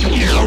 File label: LAZERM; Best Service; Advanced Mega Effects